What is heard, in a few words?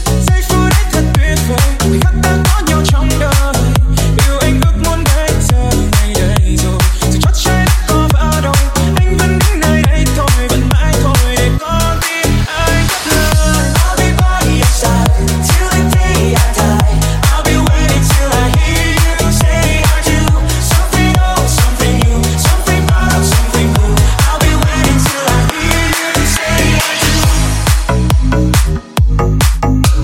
remix